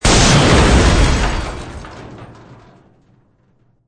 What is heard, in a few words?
Explosion Military Jeep Metal, Strong explosion as a military jeep or other metal vehicle; blows up in a sharp, huge blast with glass and metal debri